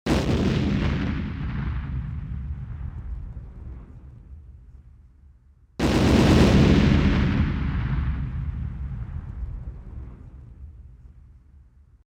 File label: Single and Multi Explosion